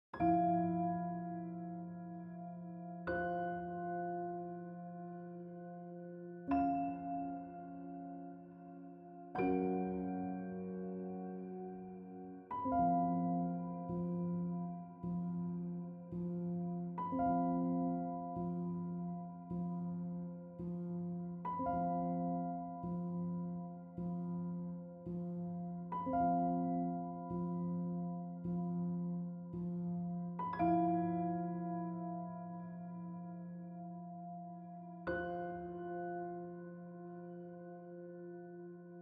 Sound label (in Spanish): interludios piano; Interludios para piano II.2025